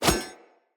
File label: Split Sword Whoosh; Weapons; Swords; Starter Split Sword